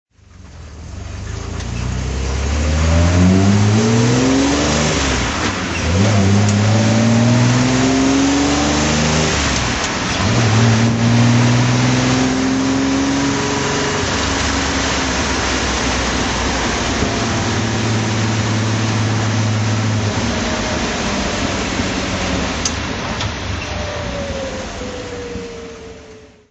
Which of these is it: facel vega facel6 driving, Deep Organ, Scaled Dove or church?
facel vega facel6 driving